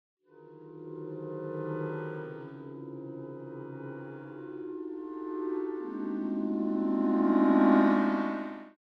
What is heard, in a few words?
Traditional Clarinets Clusters; VENTO